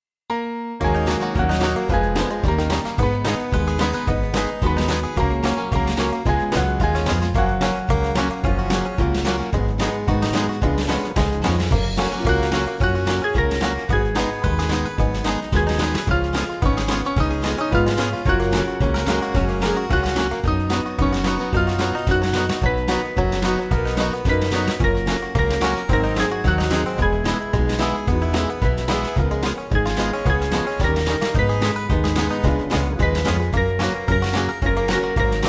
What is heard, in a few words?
Band; Where I Am